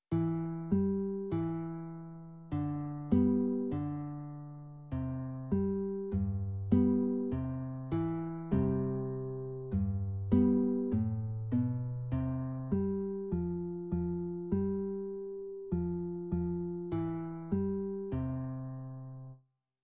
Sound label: accomp; beginner classical guitar lessons